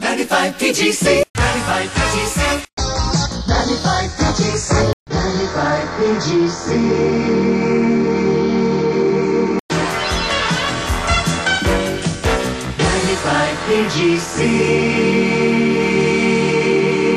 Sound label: jingles; 1984; rockville producer